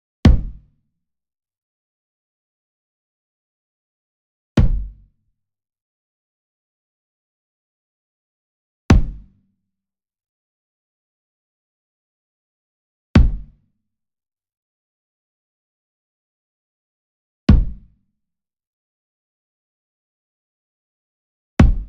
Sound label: Small Drum Tabla Hit Hard Beater Near; boom library; Cinematic Strikes Construction Kit